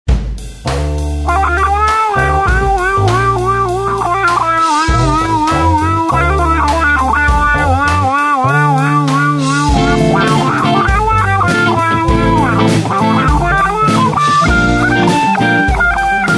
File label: Ska